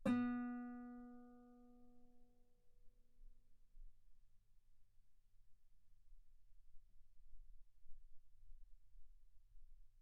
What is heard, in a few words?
Guitar ff sul B B3